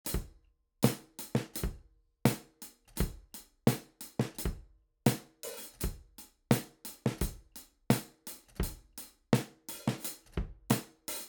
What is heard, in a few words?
DRUM BREAK